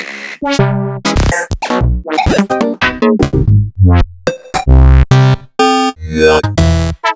Synth Shots

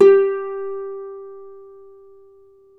Celtic Harp

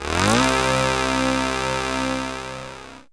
syn c, Dance Synths, Juno; Juno Jam

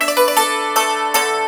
YANG CHING, Heart of Asia; Spectrasonics

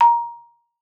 A#5, marimba; Xsample VOL-4

